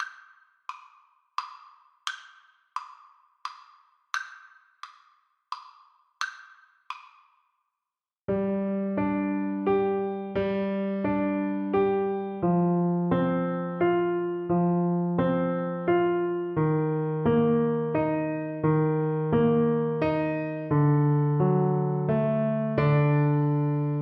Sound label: greensleeves, Trombone